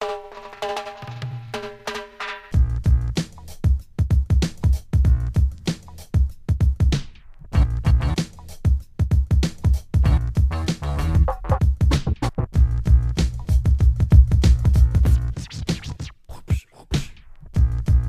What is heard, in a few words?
reggae acid scratch